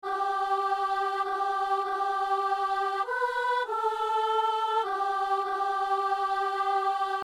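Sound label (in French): refrain pour l'élévation soliste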